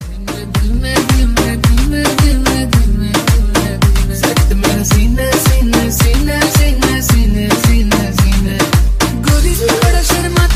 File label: new hindi ringtone